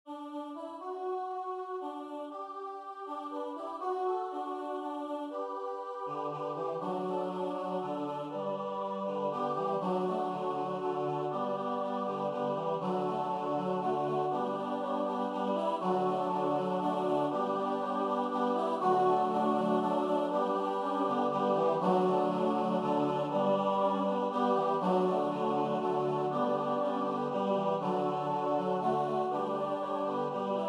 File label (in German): Kanon fuer bis zu 8 Stimmen; Zum Abschied; Nun lasst uns frohen Herzens…; Ständchen